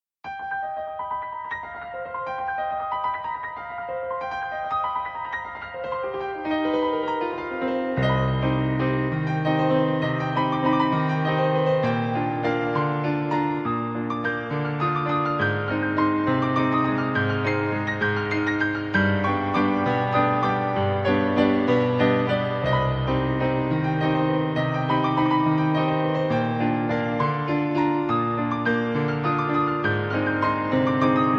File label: Piano Cover